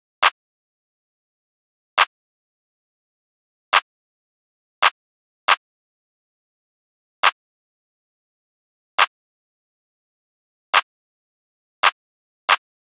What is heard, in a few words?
Drum Loop - 137 BPM Clap; Loop Stems; Cymatics; Mystery Pack Vol 4; Cage Fight